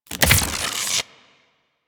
Shotgun Reload; Weapons; Revenant; Warframe; Tenno

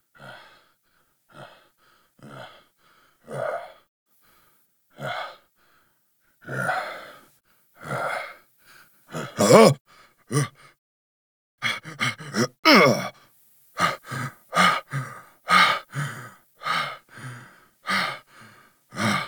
Camp Breaths; Quests; The New War; Grineer Eidolon; Kahl

